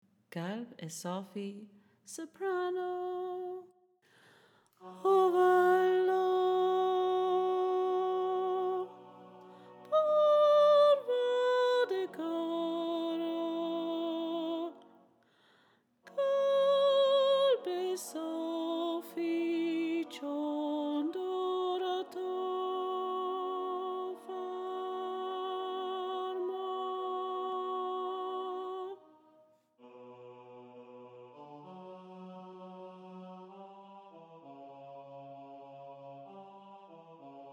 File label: soprano, choral music